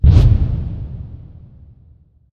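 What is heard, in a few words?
combat rocket explosion; geoscape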